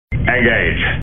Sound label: oppo a57 ringtone